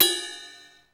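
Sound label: DR550 ride bell; 90 sSampleCDs; Crypton - DrumBase5000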